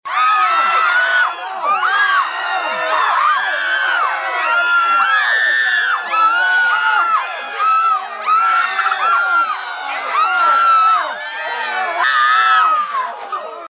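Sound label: screams of panic of people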